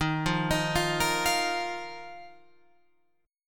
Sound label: Eb Suspended 2nd, Guitar Open F; Ebsus2; arpeggiated